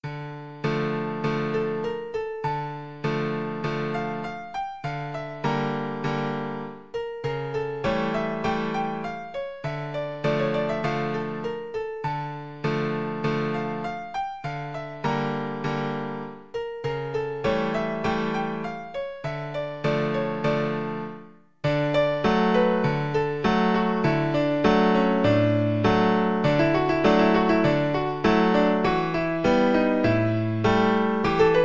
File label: czardasz